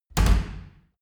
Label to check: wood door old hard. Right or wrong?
Right